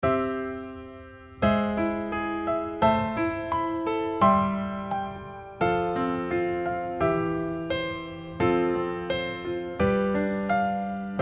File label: longtone pattern